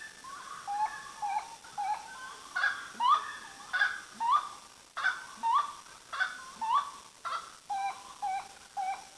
exotic, Birds of North America

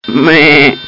sheep; extinction

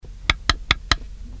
click, office; machine

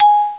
marimba

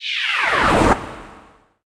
TELEPORT; bombermania

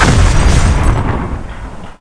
crash; PC Gamer IT CD 48; Big bang

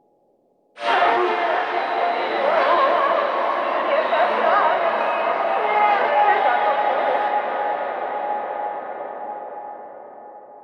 AMBNT 160; eJay; Ambient

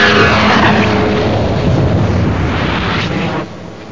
appear

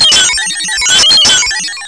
pulse

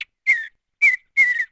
bird; DAoC

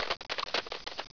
ICE CRAC; 10,000 Graphics Pack Volume 1; Media Graphics International

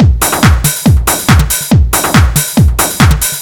Cream C, Techno Drums; TechnoMusicMaker

